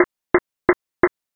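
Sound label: footsteps slipper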